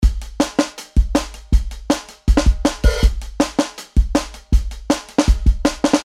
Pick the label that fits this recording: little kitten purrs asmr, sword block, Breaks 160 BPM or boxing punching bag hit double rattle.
Breaks 160 BPM